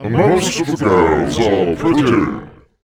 VOICE FX, Dance Vocals; Best Service ProSamples vol.12; AKAI

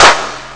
claps; ST-XX Amiga Soundtracker Sample Packs

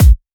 Bassdrum

